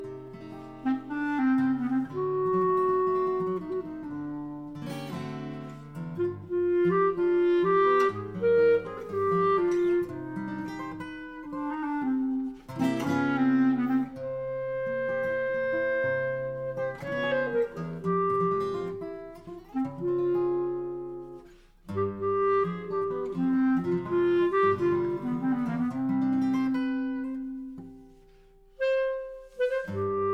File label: Clarinet Guitar